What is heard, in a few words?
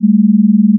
Close tones